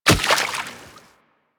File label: Step Water, Footsteps; Warframe; Kubrow